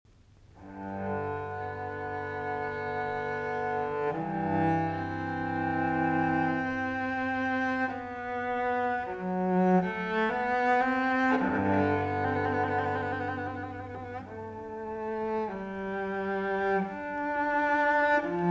Cello Suite in G Major, Sarabande; Bach, JS; No. 1; BWV 1007 IV.

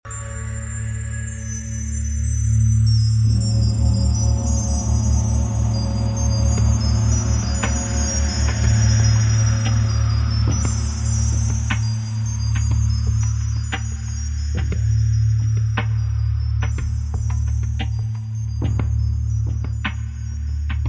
Relaxing Song

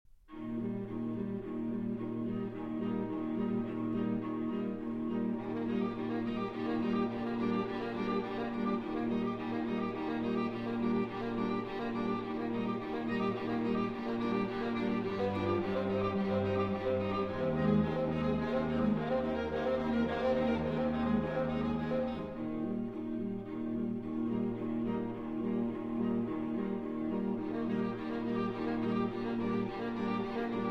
String Quartet

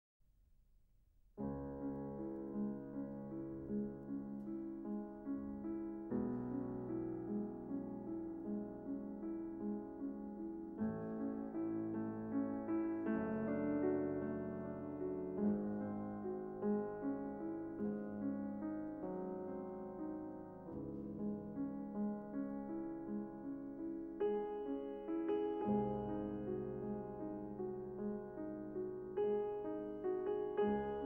Piano Sonata No. 14 in C sharp minor; 'Moonlight'; Immortal Beloved, 1994

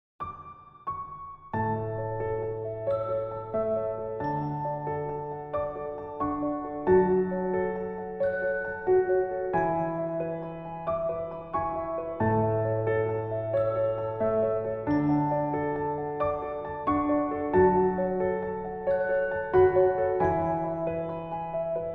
Piano

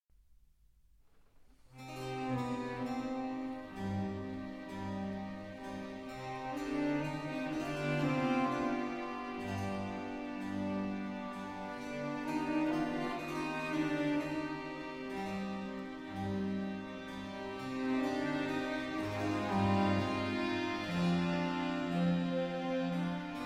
Violin Concerto in E Major, Adagio; BWV 1042

